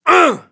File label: Elder Exert; english; voiceovers